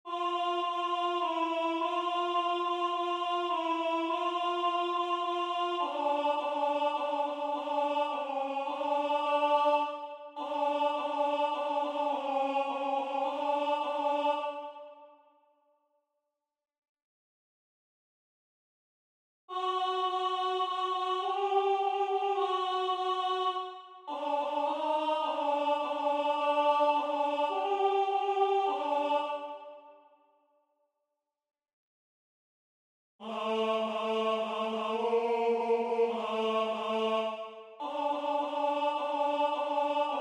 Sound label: MAGN Tenor; Durante; Magnificat